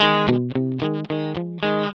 ROCK GS 110, Vintage Blues Guitar; USB Soundscan vol.22; AKAI; ROCK2

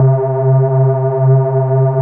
Dance Floor Bass, Multisample Basses

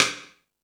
Ambient Rock, Drum Machines

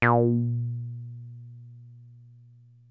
303 A2, TB 303; Hollywood Edge; Giorgio Moroder Rare Synthesizer Collection

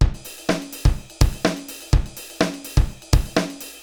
Akai XE8 (125BPM), beats

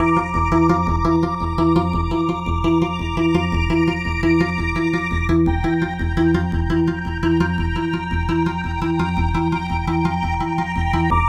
Seq Sticky bass String, Drum & Bass; Sonic Foundry (Sony Creative Software); Knutrix Drum & Bass

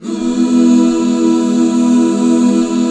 E MAJ, Back Chords; Sound & Vision - Gigapack II CD 1

